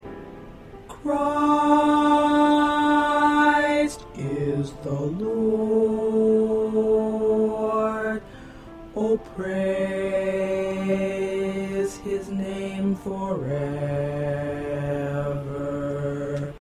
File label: Bass